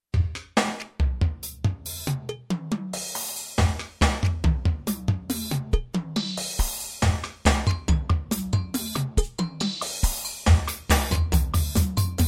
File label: techno bar; pillar of fire; theater music